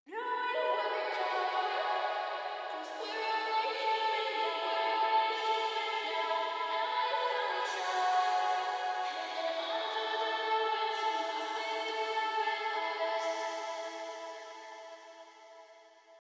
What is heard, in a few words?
Only reverb